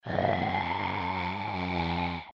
candy zombie; Adventure Time - Elemental; ThrillGames

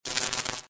sparks; Henry Danger - Day Of Danger!

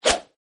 attack; Power Rangers vs Teenage Mutant Ninja Turtles - Ultimate Hero Clash 2; power april

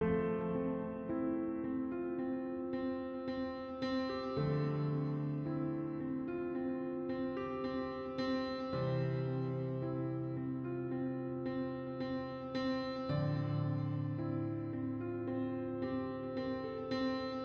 music

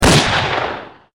fire; Dark Village

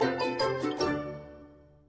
music menu outro sting; Gumball - Go Long!

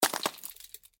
ice impact; Adventure Time - Elemental; ThrillGames

